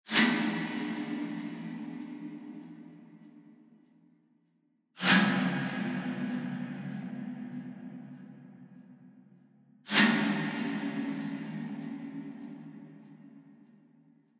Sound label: Stings Elements Chain Rip; Natural Forces